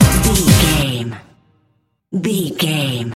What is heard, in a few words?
sting, Dance, EDM, Club, Disco, Eurodance, Confident, Energetic, Cheerful; Exercise, Aerobic, Aerobics, Spinning, Workout